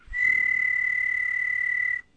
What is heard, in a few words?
TWEET; OS2 Warp Connect; MMPM2